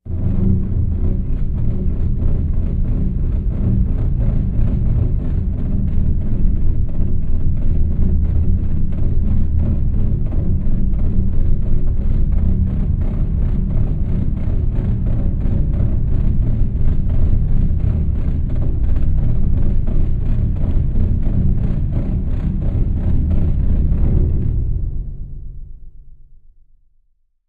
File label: Music Bass Horror, Music Bed; Processed Bass String Bowing For Horror Effe; Processed Bass String Bowing For Horror Effect.